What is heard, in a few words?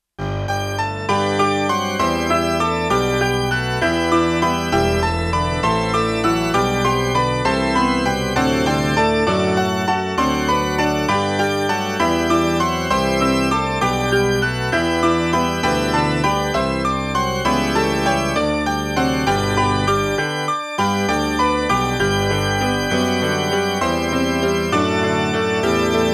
Jesu joy of mans desiring